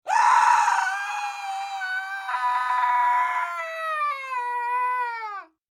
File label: Scream Horror Blood Curdling Falling Dry; Scream Blood Curdling Falling Agony Dry; Horror Terror; Screaming; Voice